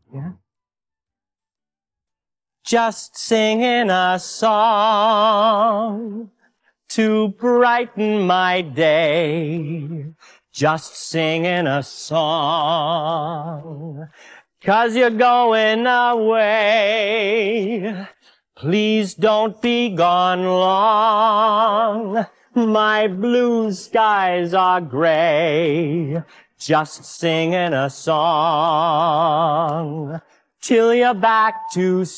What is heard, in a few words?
just singing a song vocals